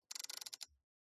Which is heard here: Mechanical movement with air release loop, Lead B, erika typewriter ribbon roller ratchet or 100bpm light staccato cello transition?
erika typewriter ribbon roller ratchet